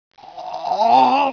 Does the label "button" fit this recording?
Yes